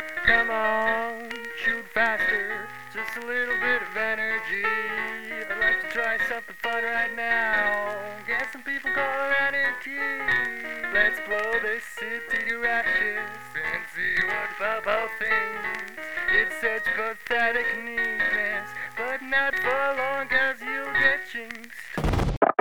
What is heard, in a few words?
emote dance music; LoL; jinx skin01